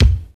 good kick one shot c key, kick drum tuned to the C note. Loudest frequency: 455Hz